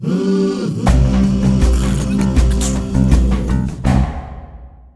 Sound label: Tribal hymn, Fanfare; 1997 MMX LAN PACK (win 95); Worms Armageddon